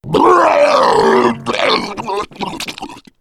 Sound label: male boomer vomit